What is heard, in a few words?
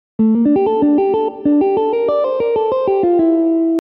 Arpeggio